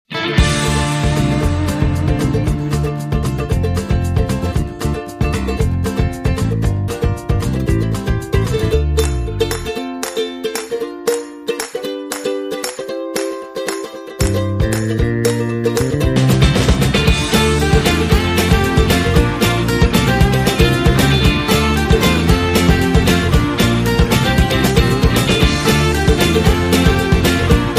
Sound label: Whistling Strings Short